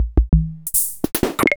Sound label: Korg Minipops